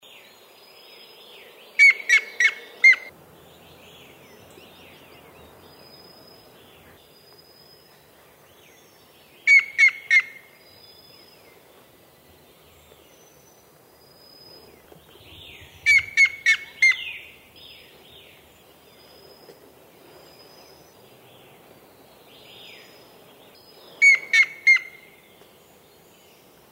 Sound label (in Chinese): 綠啄木; 灰頭綠啄木鳥; 啄木鳥科/留鳥